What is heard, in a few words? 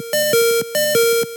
combo break; osu